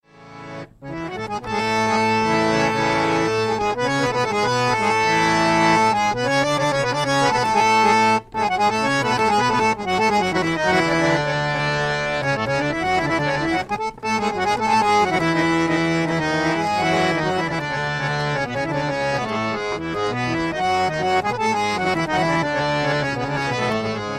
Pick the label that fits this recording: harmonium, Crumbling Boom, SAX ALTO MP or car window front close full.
harmonium